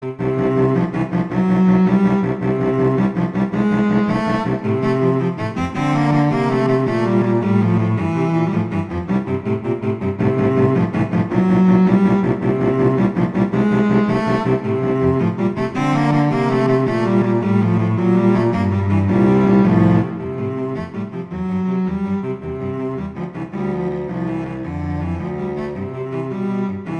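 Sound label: Duet, Allegretto, two cellos; Mazas; Duets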